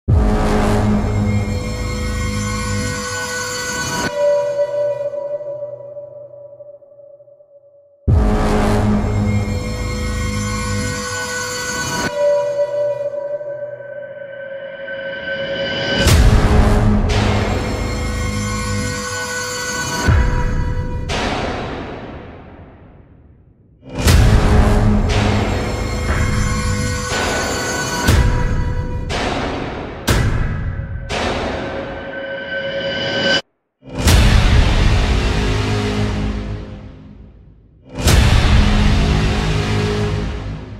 hybrid core music sound epic modern hybrid horror music